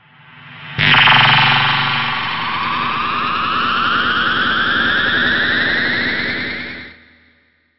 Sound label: Spaceship Takeoff; Richard